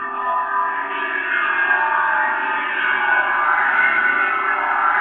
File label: GHOSTS; Pro-Rec - Synthsonic